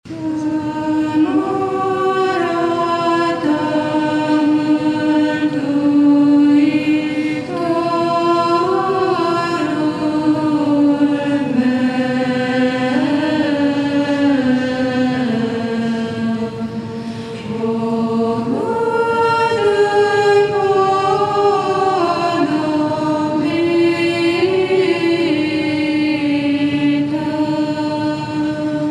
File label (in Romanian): Camara Ta, melodie traditionala; Buna Vestire Miclausen